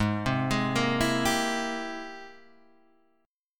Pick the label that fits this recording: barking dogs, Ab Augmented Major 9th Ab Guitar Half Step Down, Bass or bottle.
Ab Augmented Major 9th Ab Guitar Half Step Down